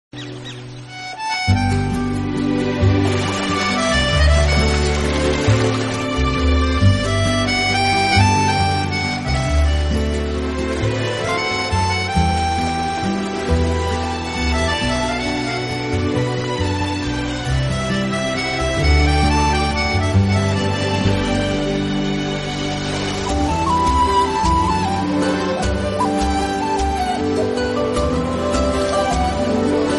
instrumental bgm

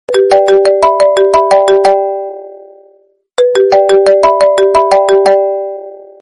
iphone 13 iphone 13 pro max message

